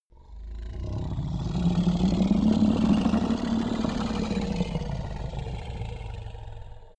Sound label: minotaur grunt; kreaturen